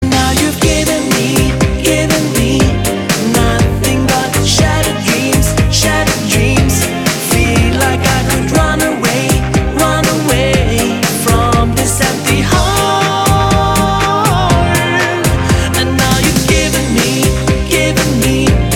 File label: Ringtone